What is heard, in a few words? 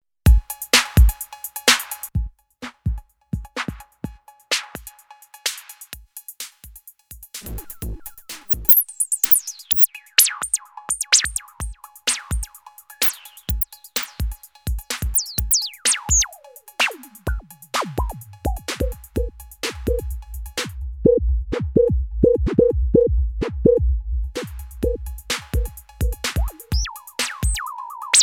bandpass env, Electrix Filter Factory